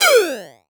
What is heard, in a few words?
Multimedia Internet CD-Rom Flash Whoosh